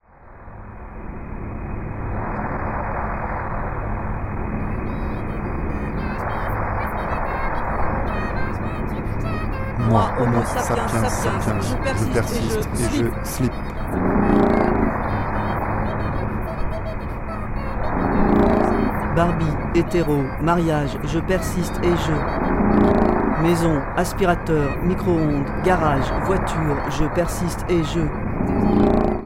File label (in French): splatch